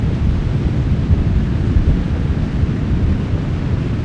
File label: mohole charge